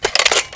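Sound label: sniper magazine in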